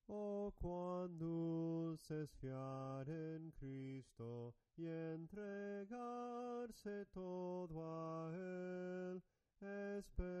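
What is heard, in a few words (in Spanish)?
SOPRANO CANTADO, himnos en melodia soprano; ¡OH CUAN DULCE ES FIAR EN CRISTO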